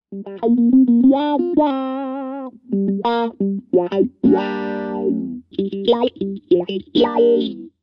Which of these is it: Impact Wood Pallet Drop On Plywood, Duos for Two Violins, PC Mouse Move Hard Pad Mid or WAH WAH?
WAH WAH